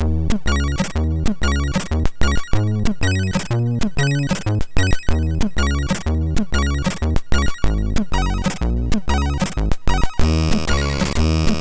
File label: Title Tune, sid, 6581; Mortal Dogfight Title Tune